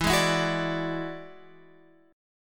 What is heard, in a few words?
E 9th E down Guitar Open F; E9 strummed; JGuitar